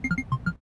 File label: classic disconnect, computer sounds